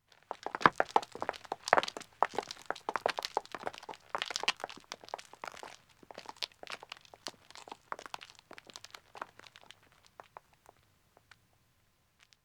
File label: Country Road Walking 2 men &, Footsteps; The BBC Sound Effects Library